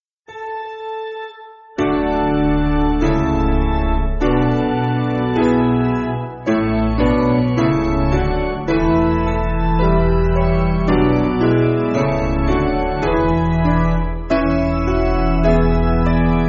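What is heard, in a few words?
Amen Piano, Music Backing Files for Churches; Basic Piano & Organ (CM); Stainer; Sevenfold Amen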